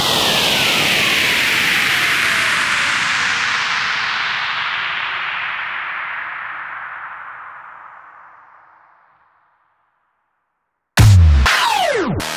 Effects

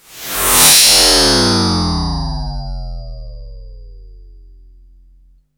FLYBY, SYN. FLYBYS; Best Service Alpha Dance vol.2

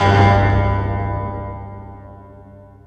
Synth guit bass; Sonic Foundry (Sony Creative Software); Ugly Remnants VOL-2; Basstuff

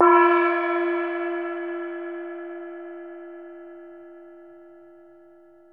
CHINESE, Asian Cymbals

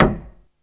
WOOD HIT D

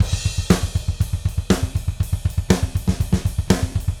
Gretsch groove 120 BPM, 1967 Gretsch Kit drums

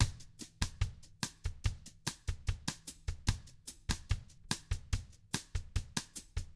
BOSSA, Drums; ILIO; Double Platinum Drums 1

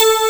DG WAVE, DIGI SYN

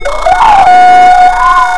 WHISTLE NSE; Big Beat Samples & More; AKAI

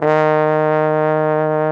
BARITON; C4; Best Service; Alpine Volksmusik

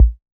OSH Kick

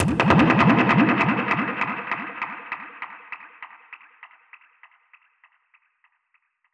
Perc Hit, dub percussion one shots; musicradar

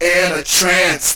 VOX SUNG; Megabass Remix! 2

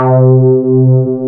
MOOG; Rubber Chicken Software Sample Bank

